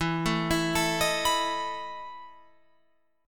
E Major 7th Suspended 4th, Guitar, Open F; EM7sus4 arpeggiated

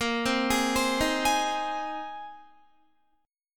A sharp 7th Suspended 2nd Suspended 4th, Guitar; A#7sus2sus4; arpeggiated; 18,15,18,17,15,15

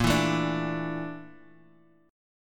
Bb Major 7th Flat 5th, Guitar, Half Step Down

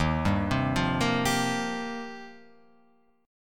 Eb 6th Add 9th, Guitar, Open F; arpeggiated; 3,2,0,0,1,2

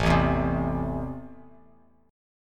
A Minor, Bass Standard; Am strummed; 5,0,2,5; JGuitar

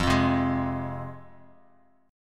F Minor F down Bass Standard; Fm strummed

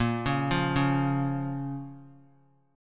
A sharp Minor A sharp down; arpeggiated; JGuitar

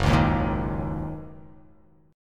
Fsharp 6th down, chord

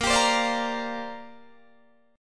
Bb 6th Add 9th Bb Guitar Open D

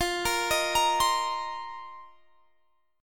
F 7th Suspended 4th F down; arpeggiated

